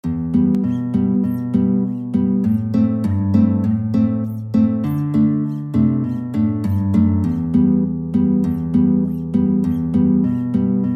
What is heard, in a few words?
guitar